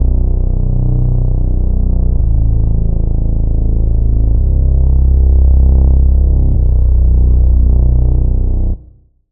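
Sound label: Reese, Bass; Reese - Loan Shark; Sylenth1; Routed Sound; Sylenth1 Expansion - Noble Gangster Vol.1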